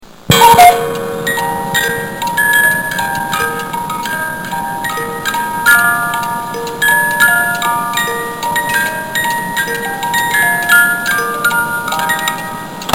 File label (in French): Coucou